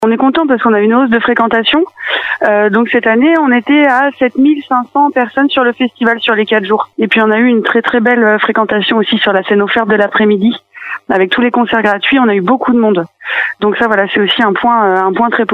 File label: brass festival